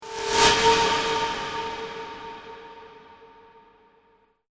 Transition Horror Whoosh Slash Scary Metallic, Transition Metallic Whoosh Slash Scary Airy; Sound Design